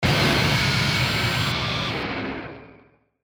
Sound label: Blast Distortion